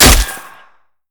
basic attacks; Zyra

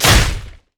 basicattack dragon; LoL; shyvana

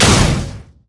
crit; LoL; lucian

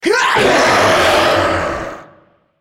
ultimate effort; Shyvana; en US

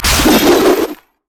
bola strike; LoL; hunter rengar

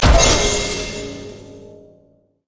vortex; LoL; diana skin02

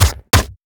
bear basicattack; LoL; annie base

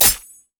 crit attack; LoL; akali

